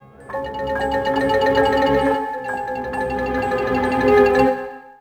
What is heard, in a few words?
Tension